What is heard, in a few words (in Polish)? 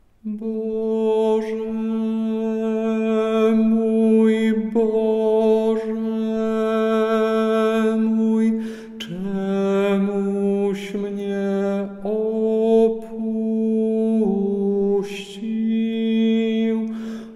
tenor; Wielki Czwartek